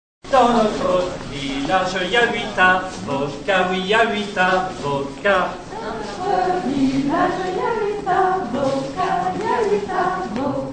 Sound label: polka; ridee